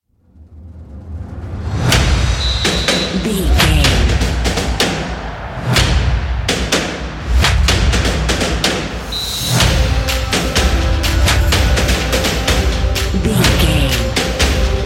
football march

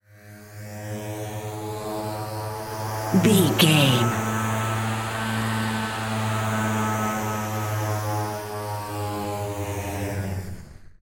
ominous war plane

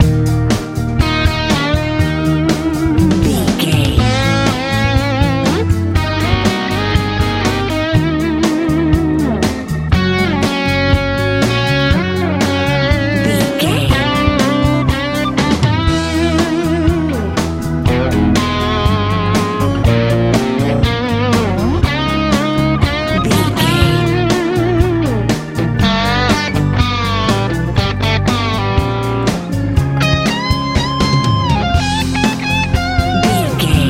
easy rock

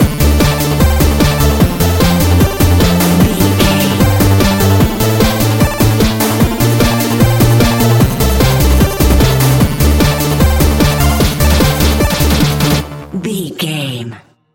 retro 8 bit game stinger

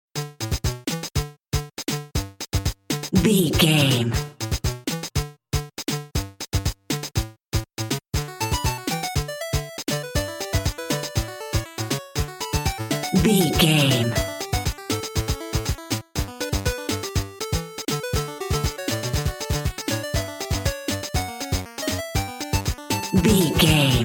tropical vibes

